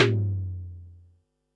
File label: snaredrum, Pearl SC-40